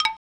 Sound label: hi wood uhoh; sgi